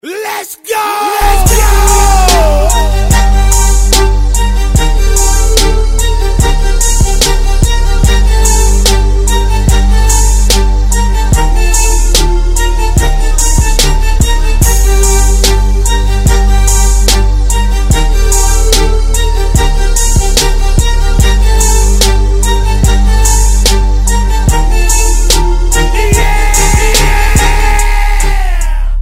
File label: hiphop